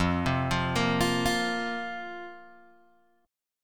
F Suspended 4th F, Guitar Open G

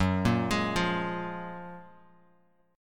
Gb Major 9th, down, Bass Standard; GbM9; arpeggiated; JGuitar

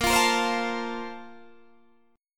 Bb Major 7th Suspended 2nd, Guitar, Standard; BbM7sus2 strummed; JGuitar

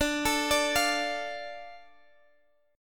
D Minor D Guitar Open F; Dm arpeggiated; x,21,21,21,20,x

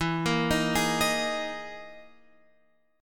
E Major 7th Flat 5th

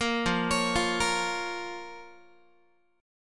F Suspended 4th Sharp 5th, down, Guitar; arpeggiated; JGuitar

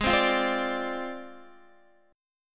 Ab 6th Flat 5th Ab, Guitar; Ab6b5 strummed; JGuitar

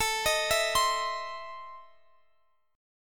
E Major 7th Suspended 4th Sharp 5th, A

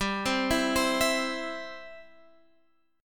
E-Minor Sharp 5th

